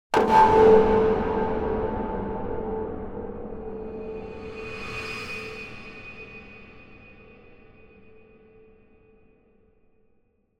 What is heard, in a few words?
Impact Reversed Reverb Pointed Wooden Hit - Long, Tension End; Hollywood Edge; sound designer's toolkit v2